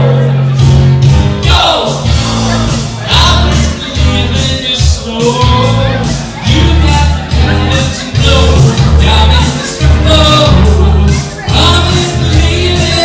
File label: karaoke; in spoons